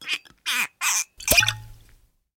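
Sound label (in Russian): тяжелое скрипучее вынимание пробки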